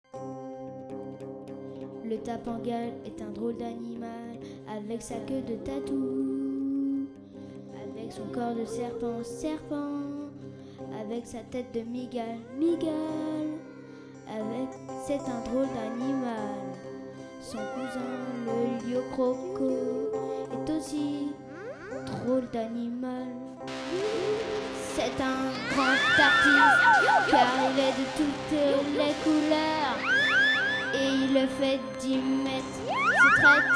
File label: rock; letapengalle; flamme